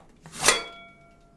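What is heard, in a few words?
metal opening harsh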